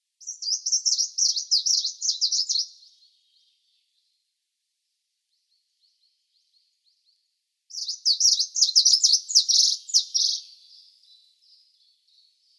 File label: Green Warbler song